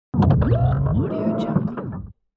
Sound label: big robot movement